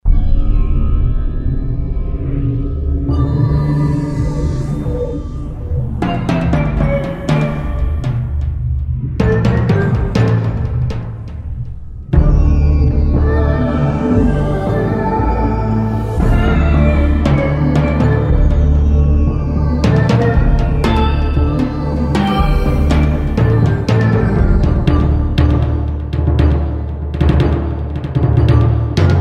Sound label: Ceremonial FX, Roland JV-2080